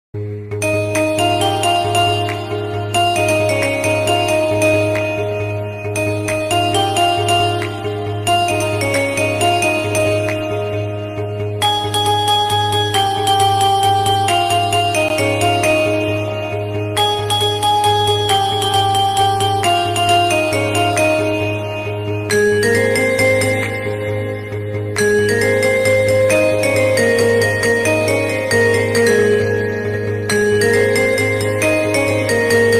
ekvira aai ringtone koligeet ringtone brass band ringtone; aagri; kolieastindia